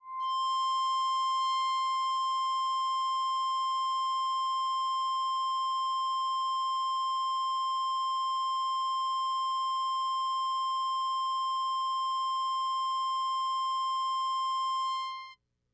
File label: EBow Guitar C5; chiptune; symfonysid